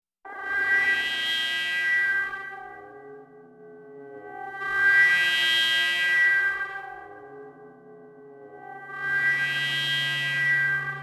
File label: Frightening Interstellar Echo sound